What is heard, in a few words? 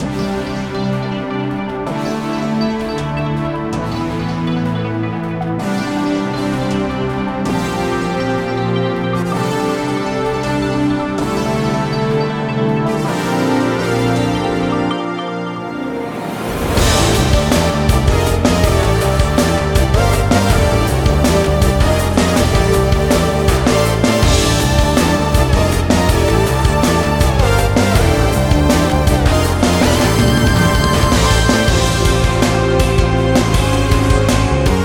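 background music dungeon; cardinal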